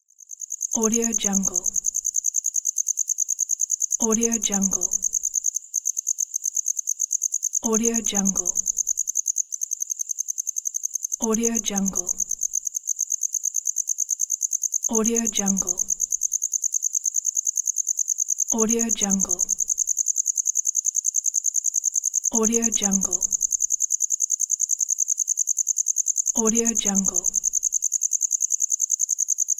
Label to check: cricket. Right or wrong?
Right